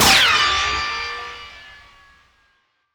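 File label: ricochet; gmod; viper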